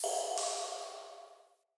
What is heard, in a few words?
Cave Ocean Drip